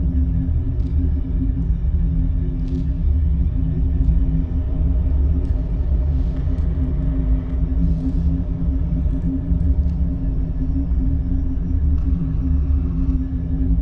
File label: dyson sphere bass hum; Stellaris; utopia